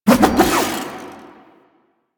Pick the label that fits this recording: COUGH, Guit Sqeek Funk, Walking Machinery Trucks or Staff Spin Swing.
Staff Spin Swing